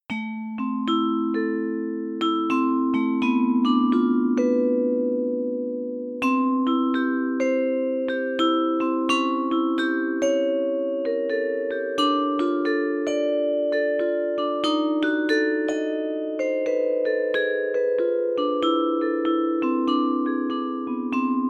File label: vibraphone